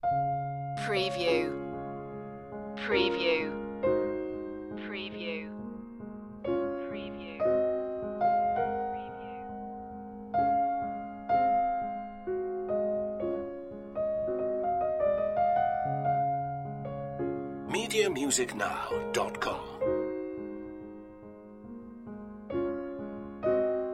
prelude d flat major; prelude opus 28 no.15 d flat major raindrop; frederic chopin

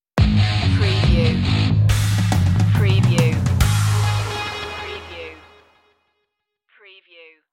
dub metal stinger; rock; hard heavy

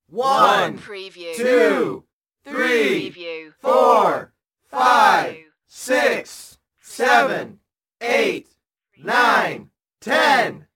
Crowd Counting Up; Crowd Ambience; lynne-publishing

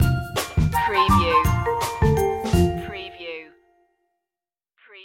scooter ride stinger; capricious

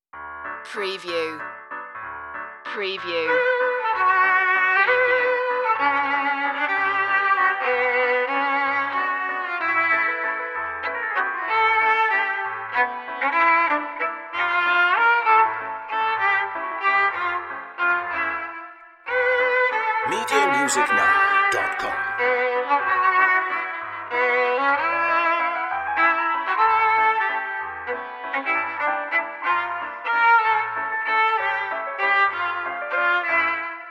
Retro Silent Movies Waltz Old recording version

